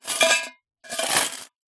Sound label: foley money collection tin coins inside tip; zapsplat